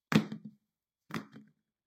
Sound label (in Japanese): スリッパを置く音; slipper